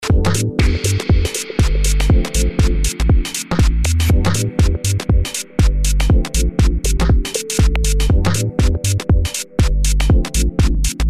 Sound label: dub echo euphoria body talk